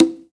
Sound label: Percussion, House